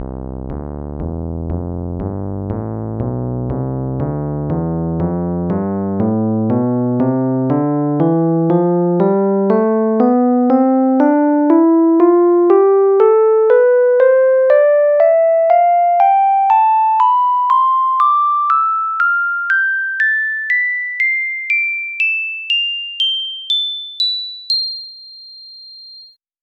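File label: rhodes electric piano; tonleiter; SpectMorph Evaluation #4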